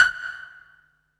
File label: PIZZ, Dance Mega Synth